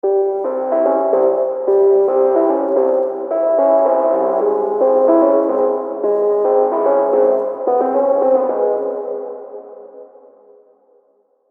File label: 110bpm, Double Harmonic; MIDI; Unison Double Harmonic Advanced Scales MIDI Collection